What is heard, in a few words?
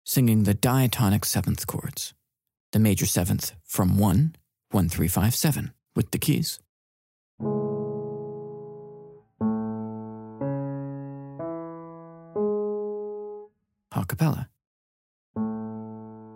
Vocal Diatonic Sevenths Exercise